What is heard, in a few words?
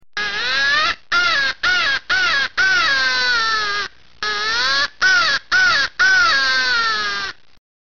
modified sentry, The Crazy Sentry Call, crow